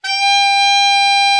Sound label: ALTO SAX; Best Service; Killer Horns